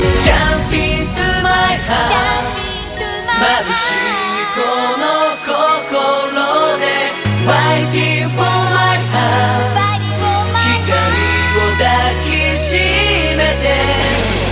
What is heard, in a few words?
Ultra Music Power